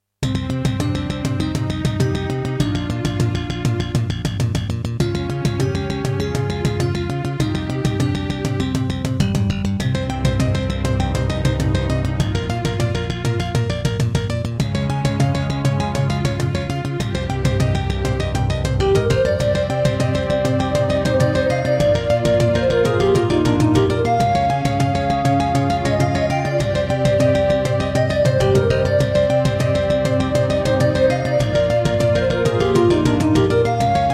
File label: Introduction, Roland SC-88; Hocus Pocus